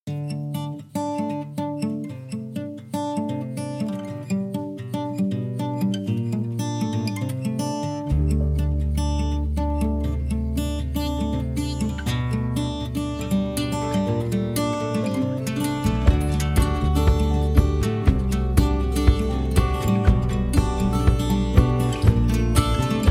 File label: leaving the north instrumental